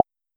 menu click; 『DTMoon』

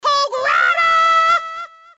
menu hit; osu skins; aold; menuhithog

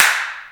soft hit clap; osu skins; nautzz

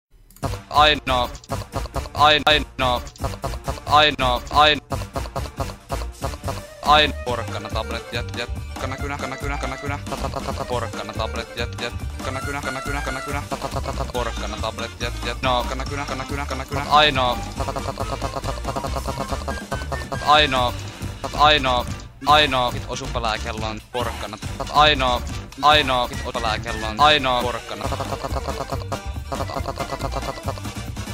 applause fake; Amasetic4.0 - gnahus

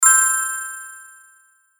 match ready; megamix

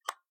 pause retry click; Tippy

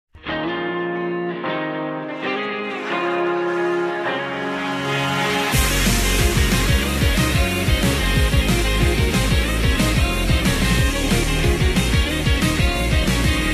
applause; DEKU; MHA

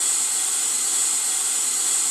normal slider slide; MonkoPastel

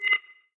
count 3s; cedru vax amas

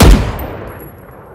key press; Clicking Head

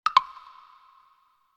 check off

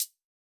drum slider tick; megarekt

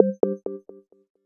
pause back click; 『DTMoon』

